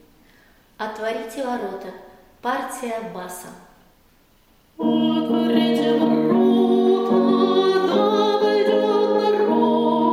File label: bas; epiphany; otvorite vorota